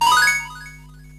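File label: System Level up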